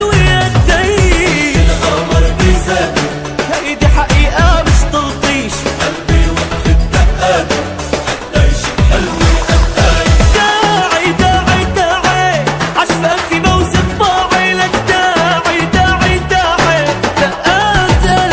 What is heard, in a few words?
new arabic ringtone